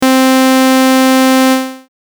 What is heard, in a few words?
sustain